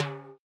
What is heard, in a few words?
RX8 Timbl L, Yamaha RX-8